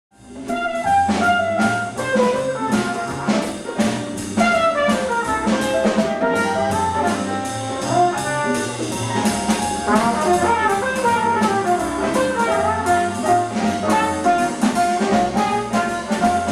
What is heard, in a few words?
jazz quartet piano bass drums trumpet